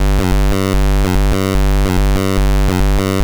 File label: 2 step sound block